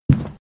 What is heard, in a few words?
footstep; phasmophobia